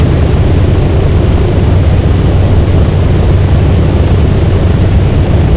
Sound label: b17 rear, engines; War Thunder